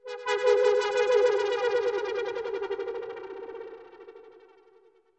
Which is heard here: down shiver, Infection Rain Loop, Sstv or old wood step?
down shiver